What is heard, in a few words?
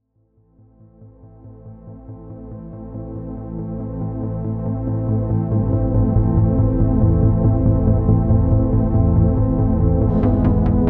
contemplations on synth neon scapes